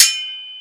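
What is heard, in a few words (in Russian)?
меч звонкий четкий в пространстве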